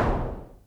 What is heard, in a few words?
SYNCUSSION